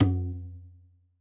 Tabla BL, Yamaha DTXpress-II, Perc

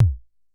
Drum, Vermona DRM1-MK2, Kicks

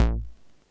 606 Kick Hard; Concrete Collage Drum Kit; Concrete-606

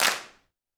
Roland TD-20 Percussion

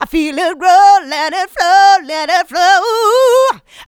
Female Vocals; Houseworx

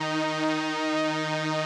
505, String Machines

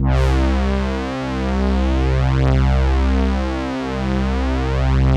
Elka Synthex Brass

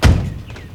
TRUCK DOOR; Transportation; Doors Hoods Brakes

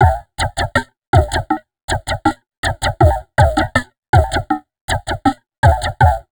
Drum Loops Crazy Processed

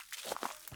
Rustly Step, Footsteps, outdoor

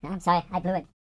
YODEL YELL; ILIO; Vocal Planet VOL-5

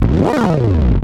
BASS GLISS, POWER SYN; AKAI S6000 CD-ROM - Volume 1; SAMPLE WAVE MIXING 2

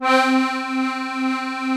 STEIRI C 3, STEIRISCHE

